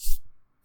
Fast Swipe

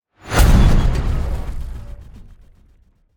Impact; Sound Design Elements

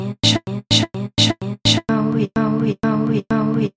Vocal

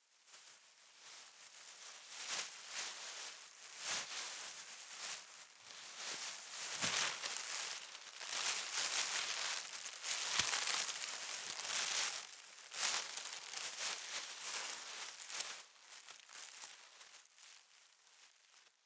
Plastic Bag Rustle

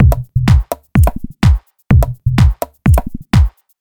Beatz

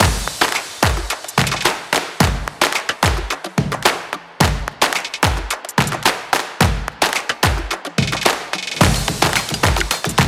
Uplifting Groove Loop

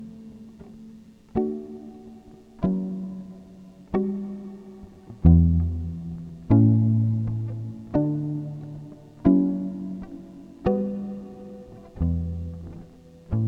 Riff

